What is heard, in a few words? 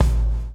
BLOW; Spectrasonics - Backbeat